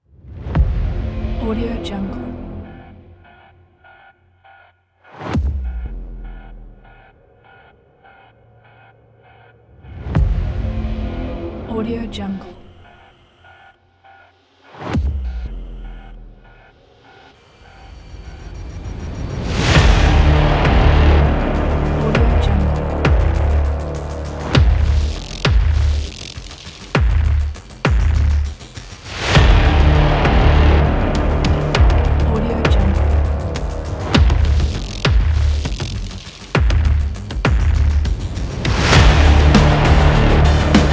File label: modern epic industrial trailer